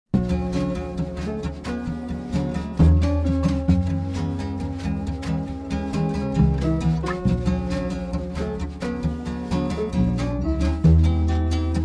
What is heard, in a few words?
Instrumental